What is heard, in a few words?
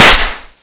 gun; svencoop